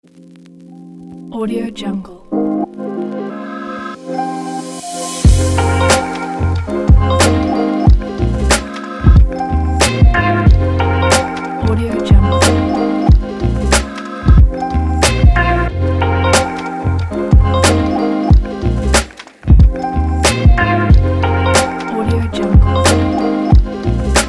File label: lofi smooth beat